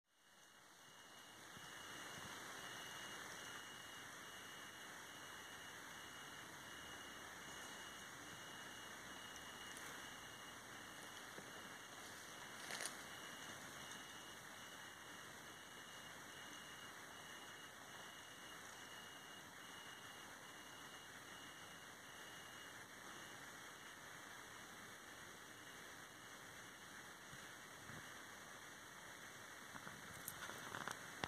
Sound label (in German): Weinhähnchen; Striesen 2017